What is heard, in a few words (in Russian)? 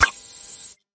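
gazovyy sharik tihiy svist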